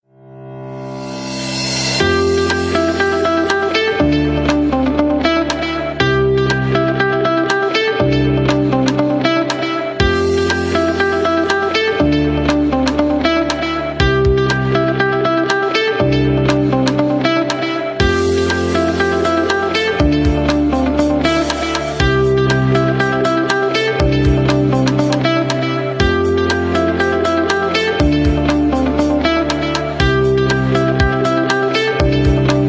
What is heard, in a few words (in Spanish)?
Música corporativo